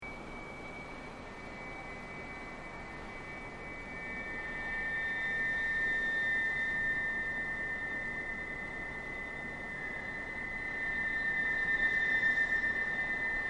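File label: Ambience Resonance Through Apartment Building, Extreme wind resonating through buildi; Extreme wind resonating through building structure; Wind Sound Effects; Foundation1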